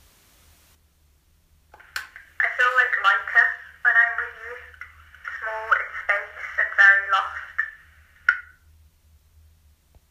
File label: Bathroom